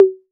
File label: 808, CONGA, Electronic